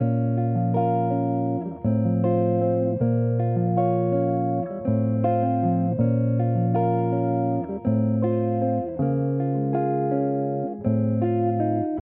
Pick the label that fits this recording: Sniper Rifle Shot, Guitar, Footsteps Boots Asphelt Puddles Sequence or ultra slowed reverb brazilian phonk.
Guitar